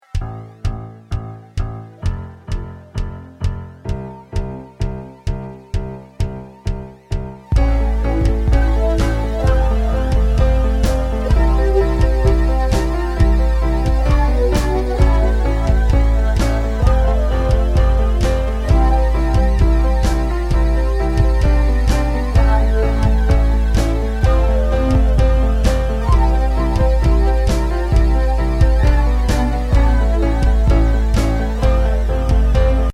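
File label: Ringtone instrumental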